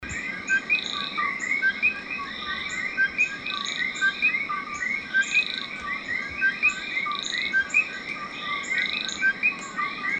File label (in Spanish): 4 especies, coqui